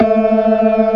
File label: ORGAN, C3 NO PERC; Keith Emerson Signature Series